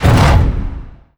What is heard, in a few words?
report open, ui